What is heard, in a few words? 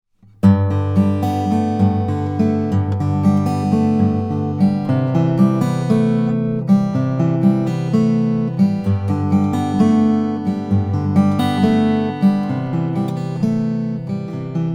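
lowden honduran rosewood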